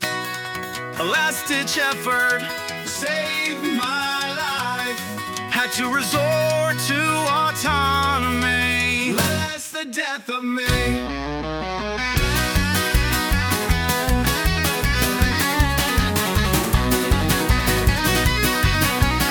Unplugged

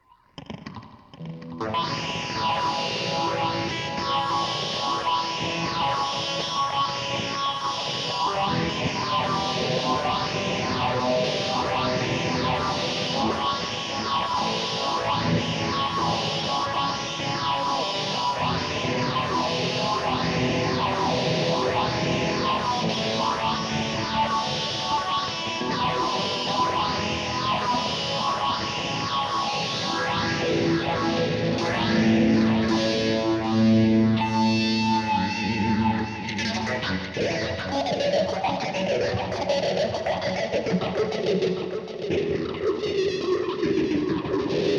electric

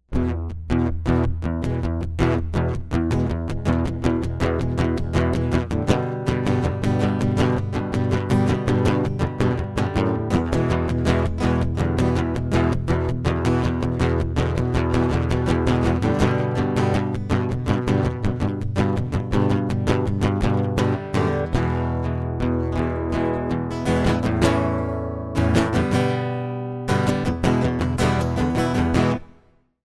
acoustic

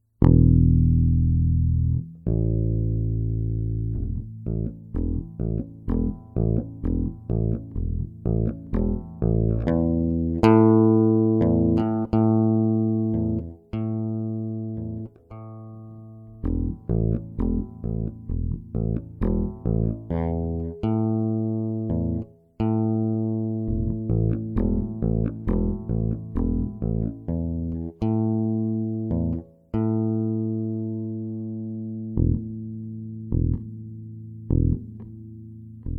electric bass; Given All the Above

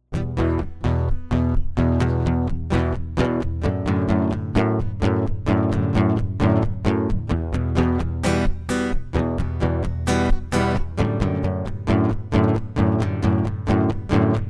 acoustic

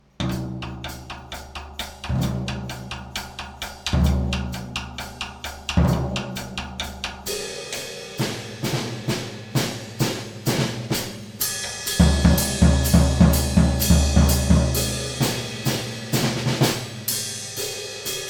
drums

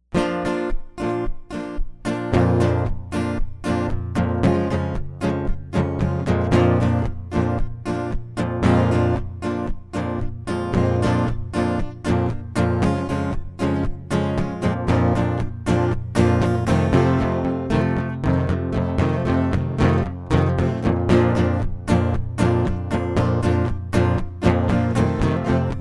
acoustic; MegaEpix

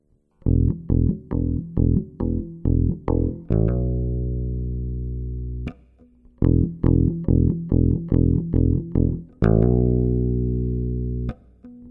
bass

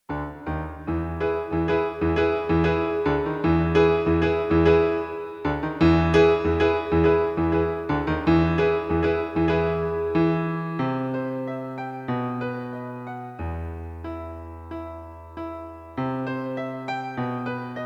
Not Quite Right paino

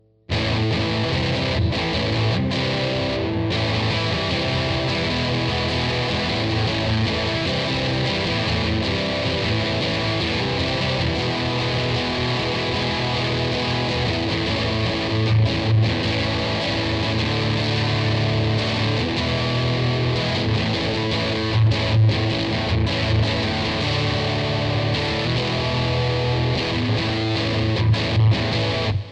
electric

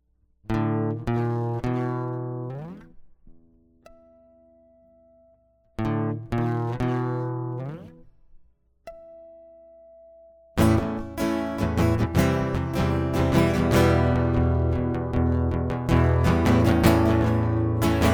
Acoustic; MegaEpix

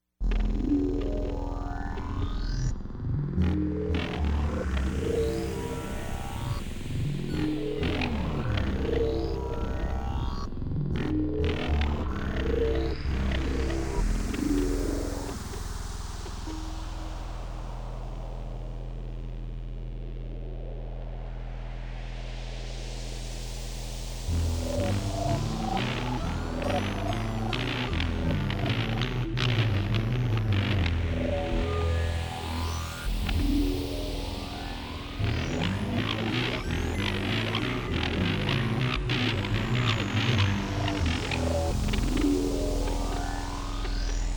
synthesizers

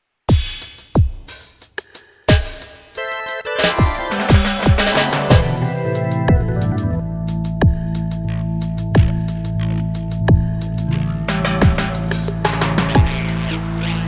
rasta intro

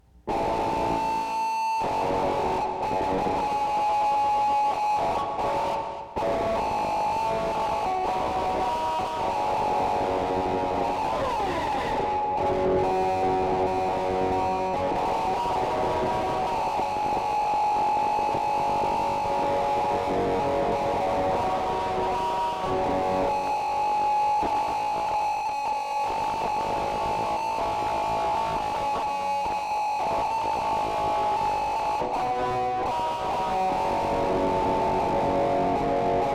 Electric; MegaEpix

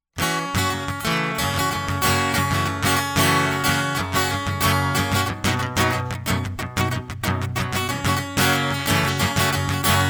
acoustic

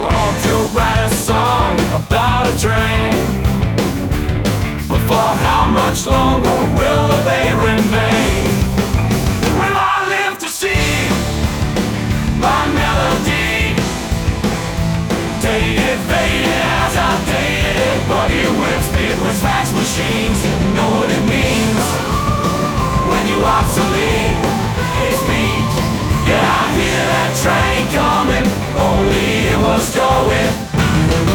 Train Song; MegaEpix Enormous

